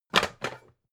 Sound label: pan stack hard